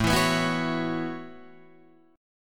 A 6th Add 9th, Guitar, Drop D; 7,7,9,9,7,9

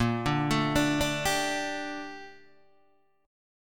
Bb Major 13th Bb Guitar Open D; 8,5,7,8,5,5

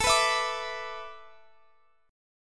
A Suspended 2nd Suspended 4th, Guitar, Open F; Asus2sus4; strummed; x,x,21,18,19,21

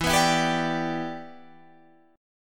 E Minor 7th

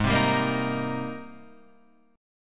Ab Major 9th Ab down, chord; AbM9; strummed

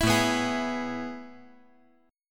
A Major 7th Suspended 2nd, chord

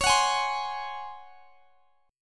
C sharp Major 7th Suspended 4th C sharp; C#M7sus4; strummed

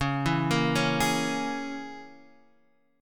Db Minor 6th Db down Guitar Standard; Dbm6 arpeggiated; JGuitar

